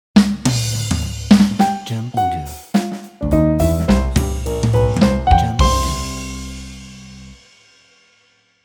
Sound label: Jazz Logo Ident